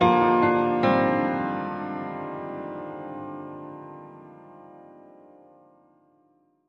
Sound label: Piano